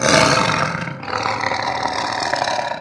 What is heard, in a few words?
die ancient guard warrior; RYL 2 v1673; Private RYL